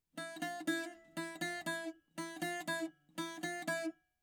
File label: bouzouki